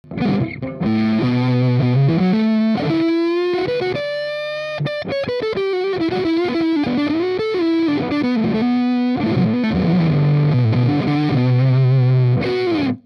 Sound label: Neck HB Solo